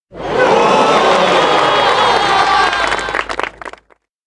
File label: cheer large; anemoi